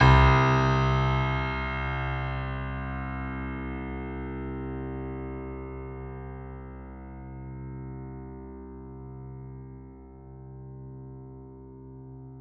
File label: ClPn C1, Piano, White Grand